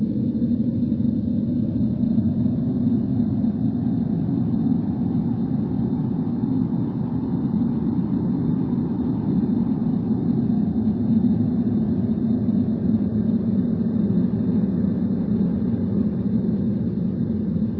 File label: wind